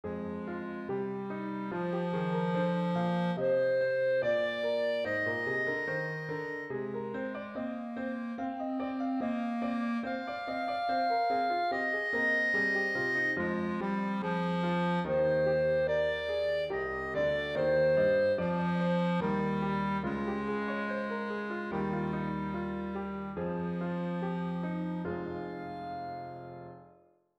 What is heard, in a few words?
clarinet